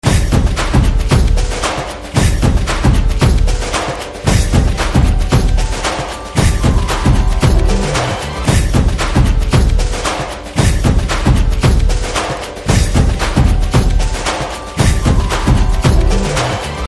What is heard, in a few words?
BGM Drum Beats